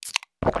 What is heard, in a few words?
soda sip